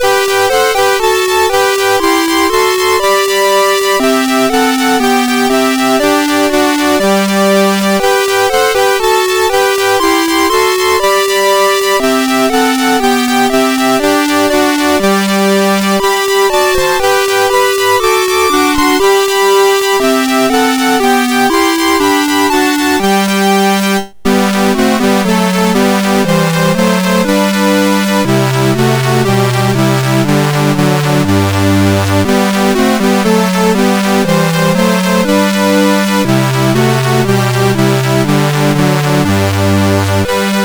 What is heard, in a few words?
As with Gladness Men of Old, MOS6581R3